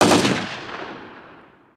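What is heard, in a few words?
dist gun, distant gun, ambient outdoor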